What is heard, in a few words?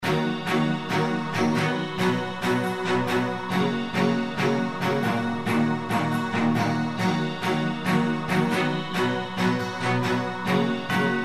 COLD STRINGS